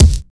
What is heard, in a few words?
clicky steel kick drum sample d key, kick single shot tuned to the D note